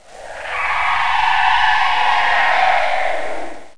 wraith

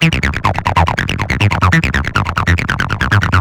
my head is a melon 140 bpm acid; makingwaves

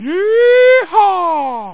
YEEHA; piles'o'tiles

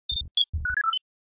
beep

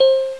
celeste; Sound Effects for Multimedia - Volume 1

